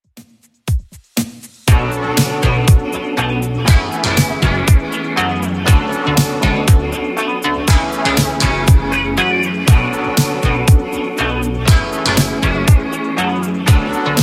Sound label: 80s Redrum 120bpm